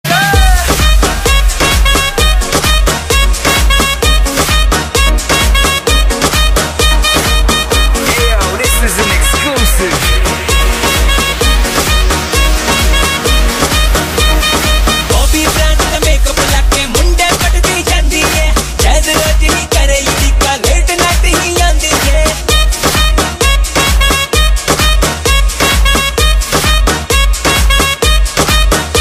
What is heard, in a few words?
party background music tone ringtone; bollywood ringtones